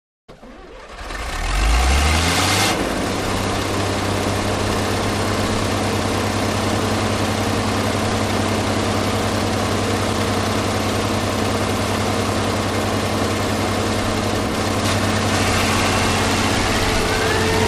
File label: Bulldozer; Bulldozer Start Up And Move Away To Long Shot.